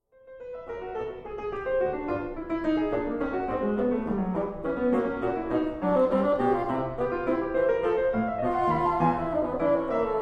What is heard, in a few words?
Bassoon Sonata